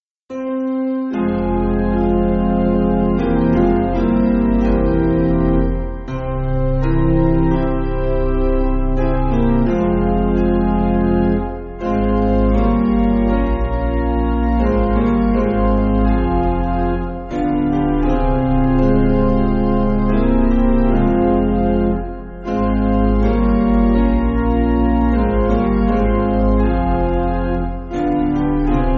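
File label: Piano, Backing Files for Churches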